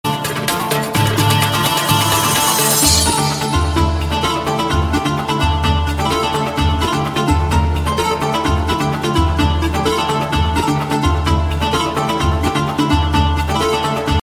soft iphone

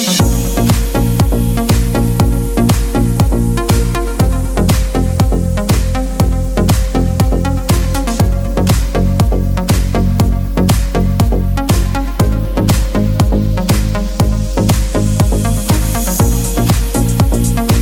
hip hop ringtone

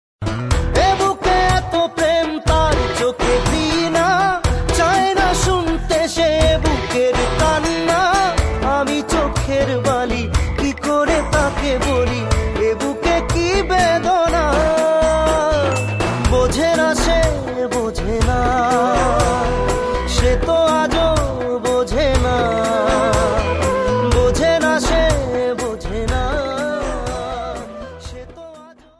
bangla sad, ringtone

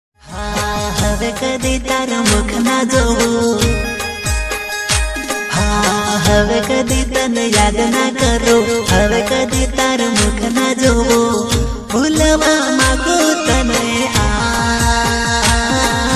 gujrati sad heart broken

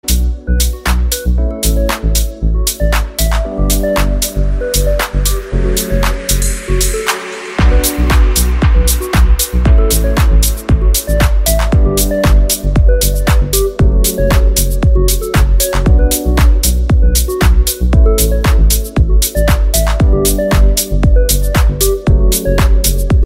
melody; iphone8

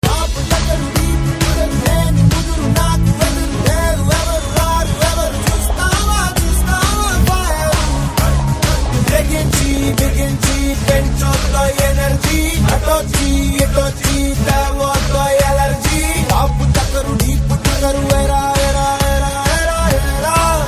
telugu tone

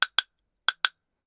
samsung clue notification

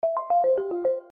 alert

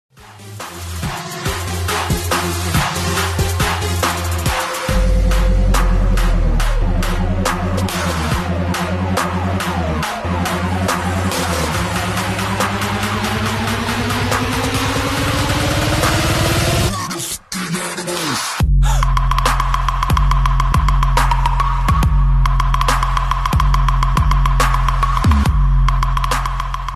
trance

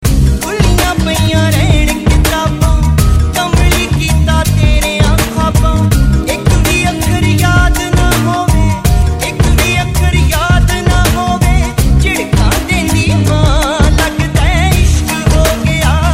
punjabi dj mix

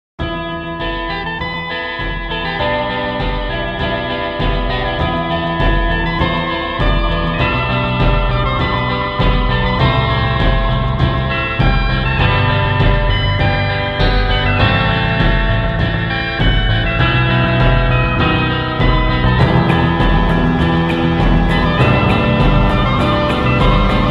waltz

